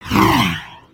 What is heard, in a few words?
idle, creatures organic